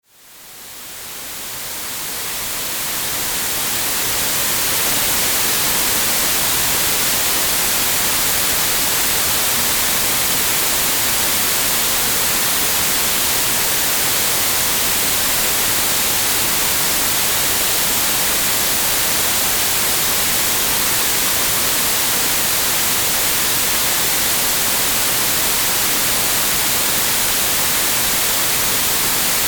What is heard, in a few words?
white noise